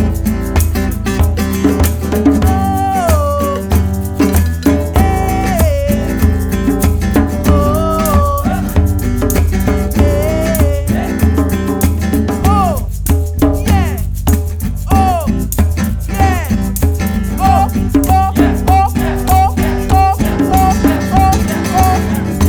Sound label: Ooo Eeh